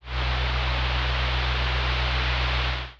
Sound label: espresso